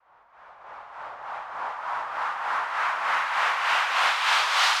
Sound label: White Noise FX (Muffled) 100 BPM; Cymatics; GHOST (Beta Pack)